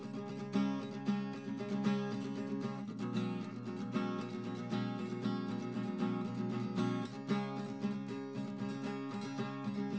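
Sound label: no drums, instantaneous mixture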